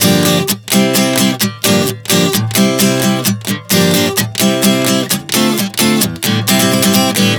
Gm-C-Dm7 130 bpm, acoustic guitar chord progressions